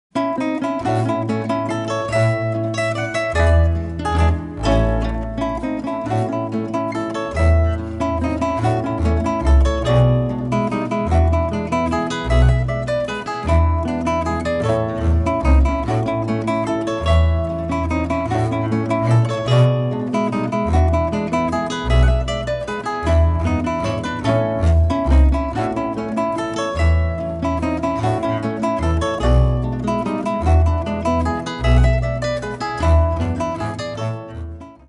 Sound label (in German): Volksmusik